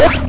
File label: shut down, roller; hl2